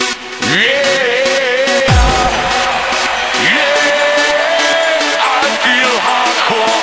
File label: feel hardcore